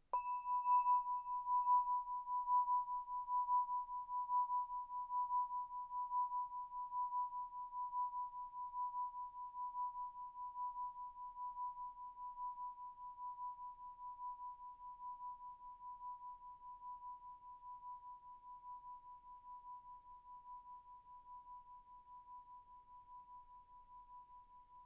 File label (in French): Cloche tubulaire 25mm B5